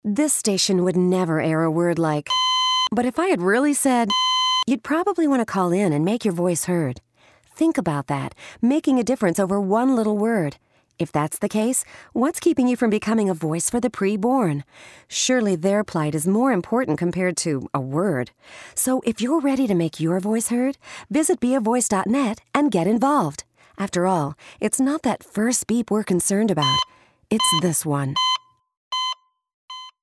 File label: bleep vs heartbeat